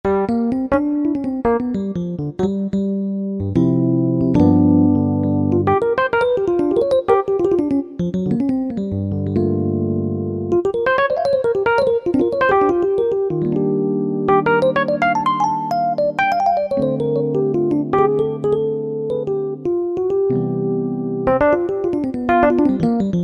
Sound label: Suitcase EP, Yamaha PSR-3000 E. Piano; Factory Voices